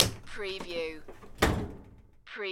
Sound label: Dishwasher Door Open